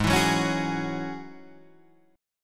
Ab 7th Suspended 4th Sharp 5th, down, Guitar, Half Step Down